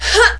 jump; opentdm; crakhor

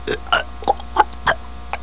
DROWN; opentdm

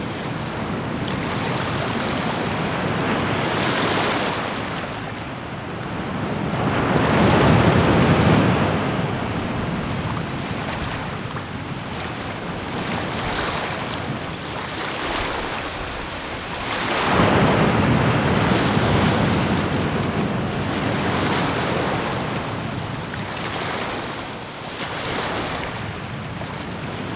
dash ocean

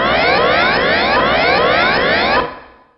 alarm

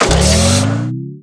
fuse in

